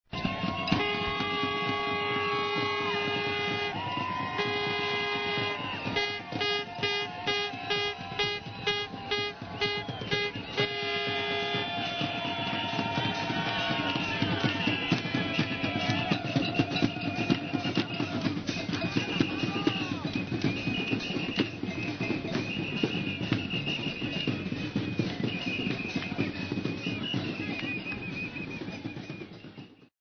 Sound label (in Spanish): trompetas tambores